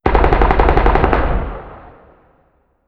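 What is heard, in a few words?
weapon anti-aircraft gun firing distant long; Explosions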